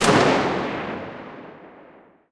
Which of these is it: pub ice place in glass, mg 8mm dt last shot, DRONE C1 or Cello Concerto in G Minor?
mg 8mm dt last shot